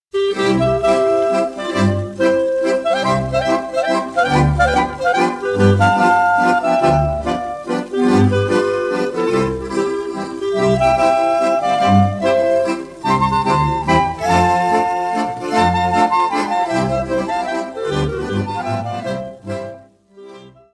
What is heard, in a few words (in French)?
Mazurka, Allemagne; Au de Waldgruenze Mazurka